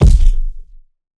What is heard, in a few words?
Punching Perc, Bag Drop; PROCESSED; 99Sounds; Punching Percussion